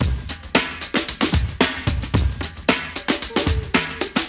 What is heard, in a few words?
breaks